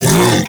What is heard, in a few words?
Predasite Vocal Tranquilized Grunt